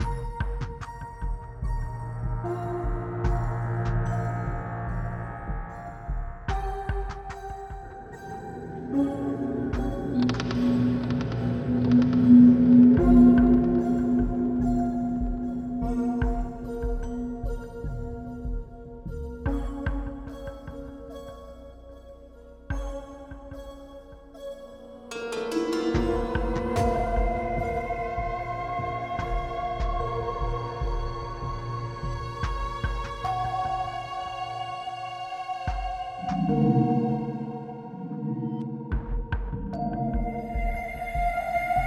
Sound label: 74BPM; ForestLiftRepair